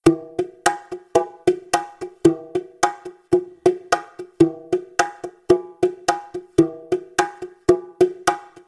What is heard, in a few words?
Bongo ola, speed 0.56; tsmtoolbox